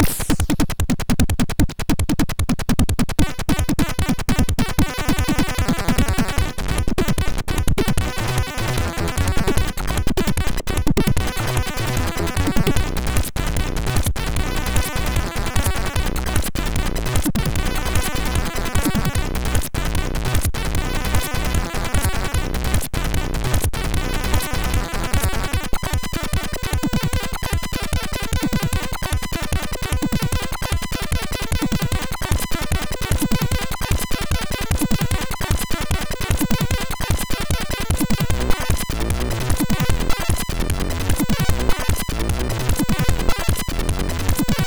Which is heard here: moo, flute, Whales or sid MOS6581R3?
sid MOS6581R3